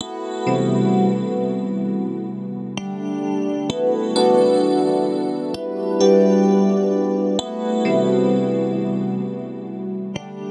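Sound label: G major Keys, 65; Koi Fish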